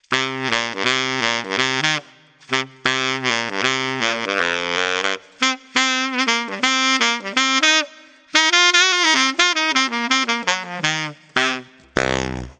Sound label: baritone saxophone